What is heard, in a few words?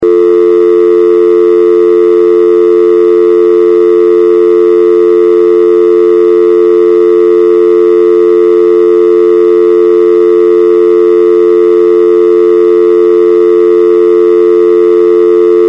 Dial Tone North American; 60 seconds